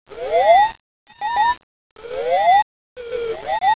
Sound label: song; betweenworld